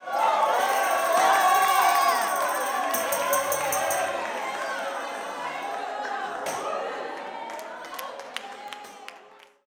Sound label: 160 TAMB BRK; Blues & Soul